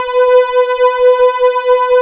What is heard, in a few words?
MIDI pad, synth pad